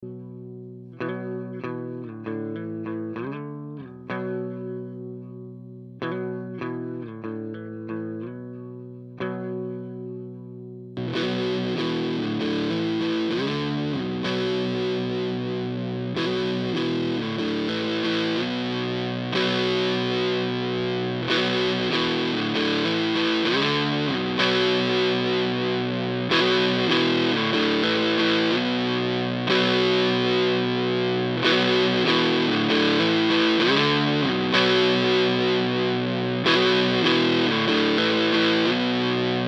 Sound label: EQD Plumes Mode 3 Tone